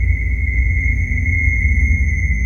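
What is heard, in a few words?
Ambience Sci-fi Energy Loop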